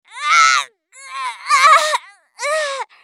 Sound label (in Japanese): マスケッティア女 死亡